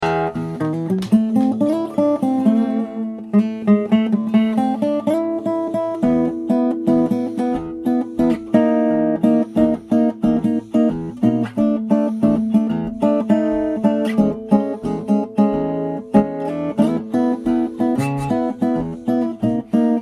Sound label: Bossa Novish